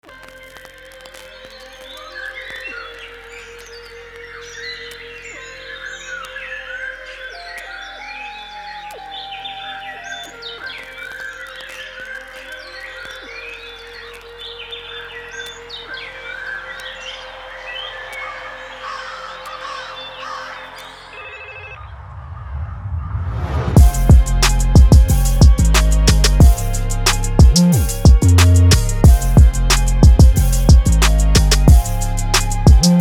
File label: Beat